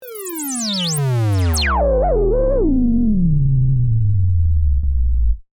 Oscillator Descend, Scifi, Oscillator, Descend, Science, Fiction; Stutter; Sci Fi Sound Effects; BlastWave